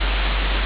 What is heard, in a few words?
ambience room tone